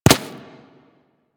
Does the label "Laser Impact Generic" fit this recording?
Yes